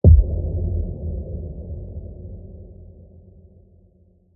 Impact; PMSFX

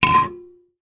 food drink can beer full down; zapsplat

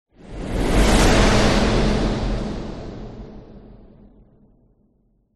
whoosh; orlando lanzini

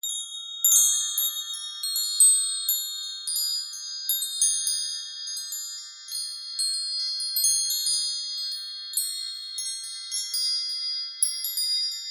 household windchime metal small; zapsplat

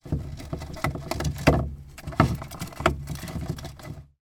animals gerbil chewing card box short; sound effects one

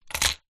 vehicles keys car set down wood

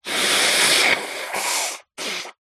female nose blow

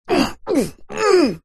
human male fight vocalisation grunt; zapsplat

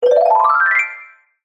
cartoon ascend mallet orchestral; zapsplat

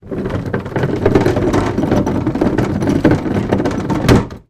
household bin small wheelie bin roll set down; sound effects one

